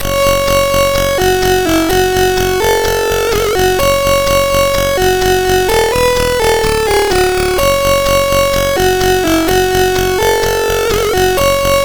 Russian MOS6581R2; sid

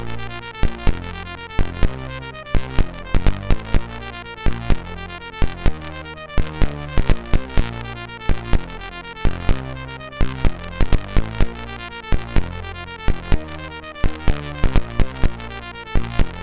Clik Clak, MOS6581R3

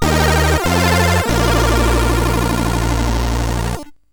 Power sid CSG8580R5

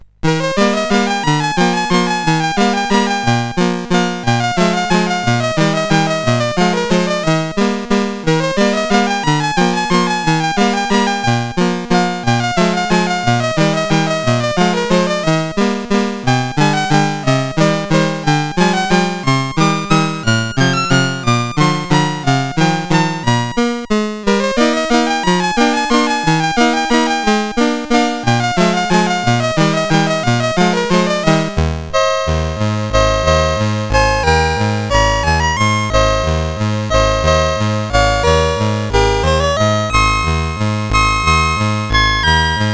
Waltz, CSG8580R5; Beethoven; Master Composer

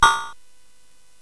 Fast Sfx, CSG8580R5 sid

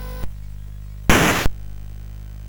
Dark Castle, CSG8580R5

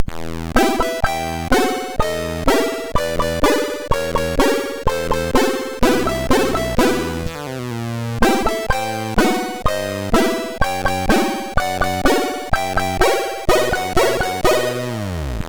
Jingles, sid MOS6581R2; Deek

